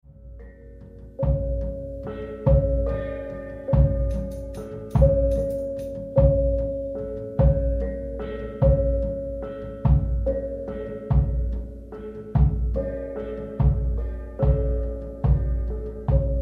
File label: Gongs